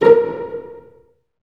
STR SEC, STRINGS; Roland - LA Composer vol. 1

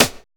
ENERGY SN; Drums In Your FACE!

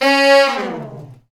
brass fat falls; Roland LCDP06; Fat Section

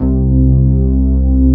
SYNTHS; Pro-Rec - Synthsonic; JV ANALOG

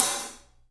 Studio Hats, 3DPercussives; E-MU Producer Series Vol. 5 – 3-D Audio Collection

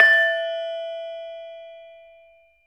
LAMEL E4 -L; USB Soundscan vol.60; AKAI; LAMELOFO

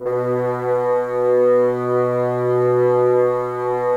Bassoons B 2; Miroslav Vitous - Woodwind Brass Ensemble

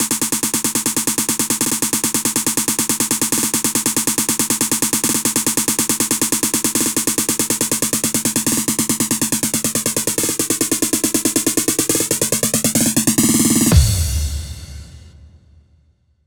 Snare Roll, Drum Loops 140bpm; Zero-G Trance Inducer

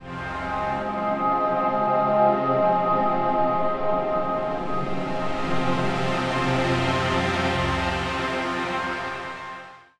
Drama Pad, cinematic soundbeds; musicradar

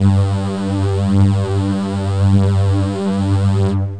Particle Horn Drone, Woodwind

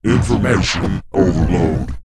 PITCH MOD, Vocal, SCI-FI; 5 SCI-FI CHZ

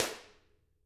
Drums, SNR; CAMCO

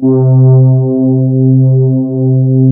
MELLOW, YAMAHA; Analog Fantasies; USB Soundscan vol.49; AKAI

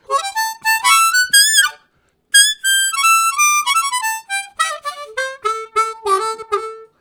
Highest, Harmonica; Zero G

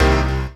HOUSE, House Dance

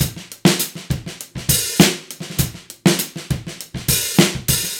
Beat Loops; Zero-G; Monster Beats

